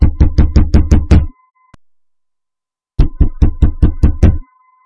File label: door pound; svencoop